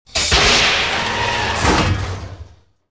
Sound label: hatch open; atst